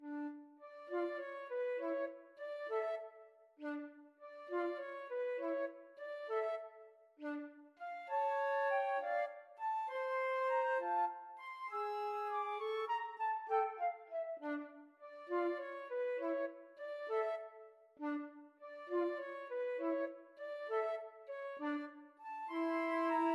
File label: marionette flute